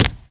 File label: land, foot; The Elder Scrolls III - Morrowind